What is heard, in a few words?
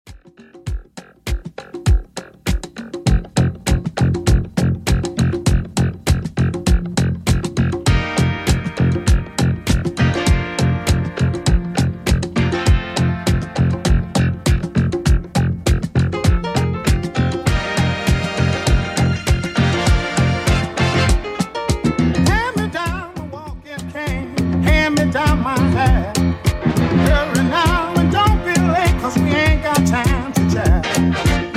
70s Redrum 100bpm